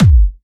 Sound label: Bassdrums UK Trance Kicks; Vengeance Samples; VEC2 Bassdrums